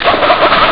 car start; Amiga Soundtracker sample

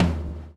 BLW FILL 4 R

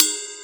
CYMBAL; Best Service Advanced Media Trax Modern Composer

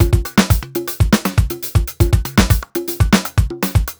120 full, Nu Jazz House Beats; PowerFX

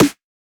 Snare, Dance Drum Kit; 99Sounds x Monosounds; Twilight

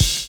JAZZY; Univers Sons

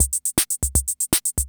CR78

